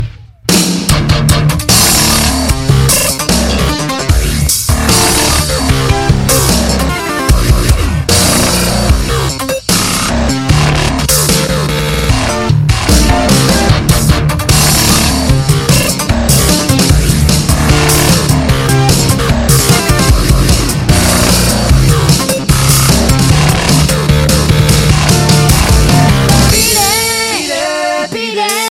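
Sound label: loud drum ringtone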